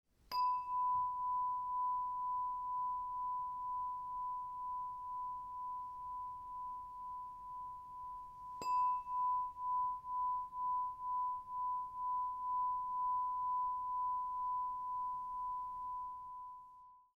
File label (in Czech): zavesny meditacni zvon 999 hz; energie